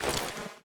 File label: wpn hud off; Left 4 Dead 2